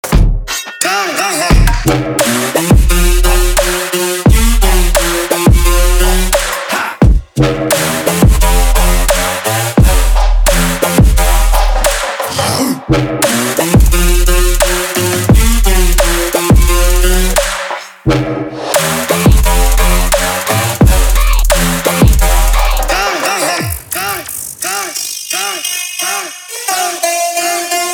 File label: trap